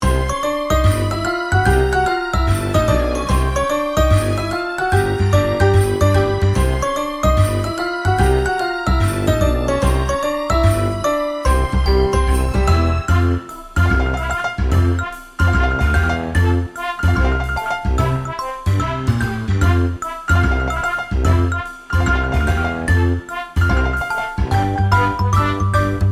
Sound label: Holiday Blizzard; in The Brrrgh